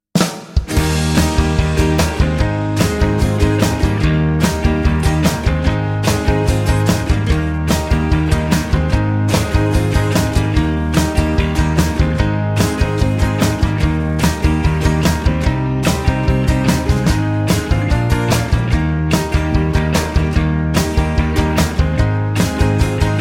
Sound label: (Eb) No Backing Vocals